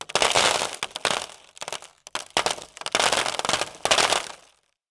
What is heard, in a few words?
small debris